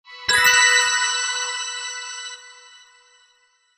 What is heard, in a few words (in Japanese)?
kirakira